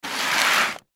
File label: Cardboard Box Drag, Cardboard Box Drag On Cement Short; Dragging; Foley